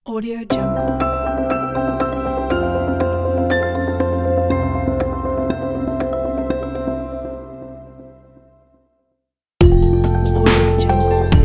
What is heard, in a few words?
elegant logo pack